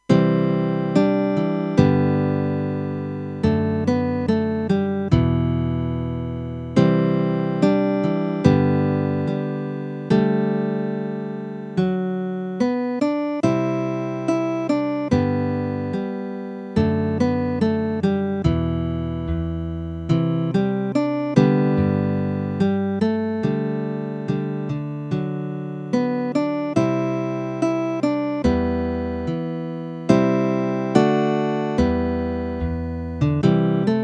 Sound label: synth